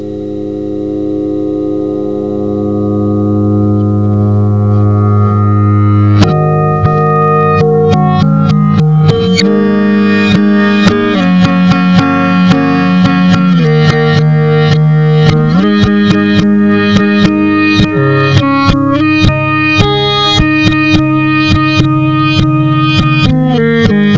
SITAR

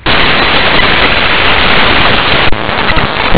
BIRD

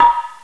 BLOCKS

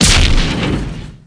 HIT LARGE

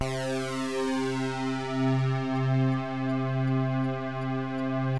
JUPITER, SYNTH; JUPITER6

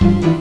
LURE

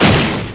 EXPLO

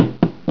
STEPS

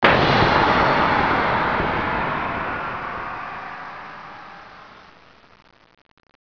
MOCK

